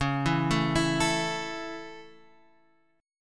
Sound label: Db Minor Sharp 5th Db down Guitar Half Step Down